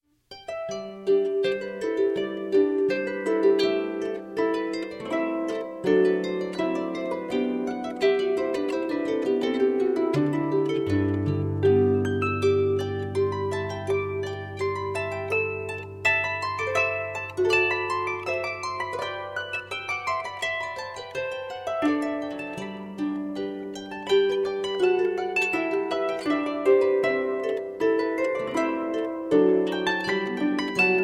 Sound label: Irish Harp; George Brabazon